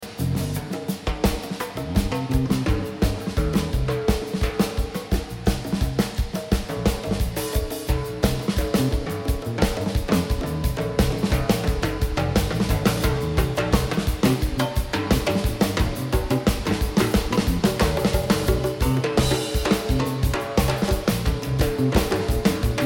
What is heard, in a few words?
Drums